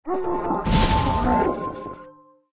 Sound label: guardian dead, monster; legacy guardian